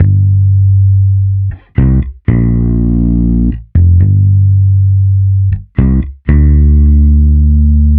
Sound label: Bass Guitar 120BPM Gm; 99Sounds x Monosounds; Instrument Loops